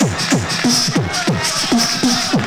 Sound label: toasted marshmallow, Beatbox, Drum loops